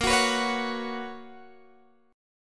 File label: B Major 7th Suspended 2nd, chord